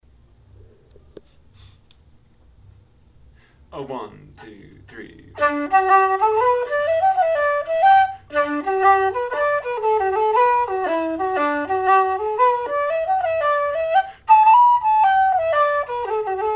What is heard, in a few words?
fast; Boys of Ballisadare